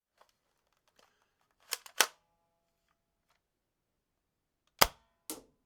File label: gun shooting cocking foam